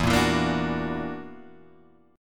Gb Augmented 7th E

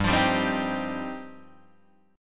G 7th Suspended 4th Sharp 5th, Guitar DADGAD

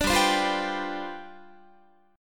G 11th, Guitar; G11 strummed; 17,15,15,15,15,18

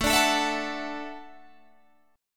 D-Major Add 9th A down Guitar Standard; strummed; JGuitar

